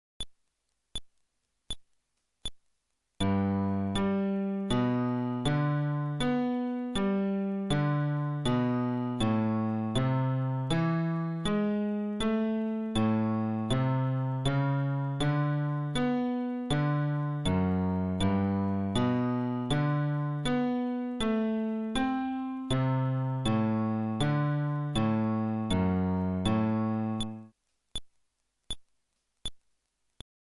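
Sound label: Arpeggio テンポ80, フレットレス bass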